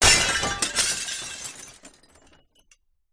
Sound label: impacts glass smash large window